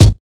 snappy steel kick drum sample g key, Royality free bass drum one shot